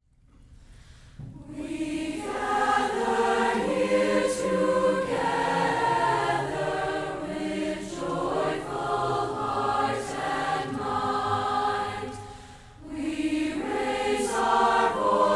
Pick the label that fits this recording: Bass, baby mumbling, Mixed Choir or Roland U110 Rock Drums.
Mixed Choir